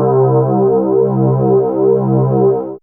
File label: Flange Guit C3, Fairlight CMI IIe, Guitars